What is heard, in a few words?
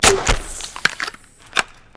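pistol shot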